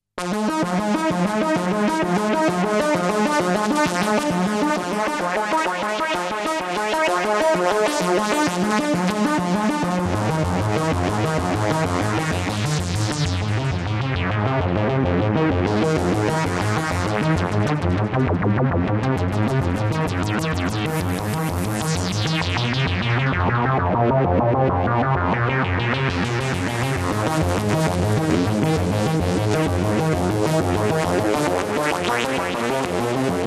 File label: MaxiKorg; Korg 800DV